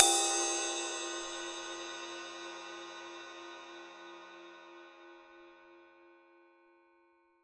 ride sample G key, Royality free ride sample tuned to the G note. Loudest frequency: 6651Hz